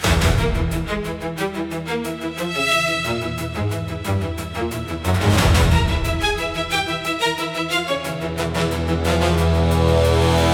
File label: high tension suspense for confrontation; puzzle solving and tracking